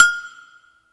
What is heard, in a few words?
Agogo H, Yamaha PSR E305